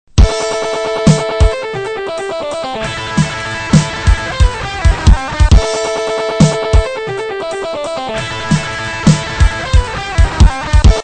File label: Triplets, Swing 4/4 time, 180 bpm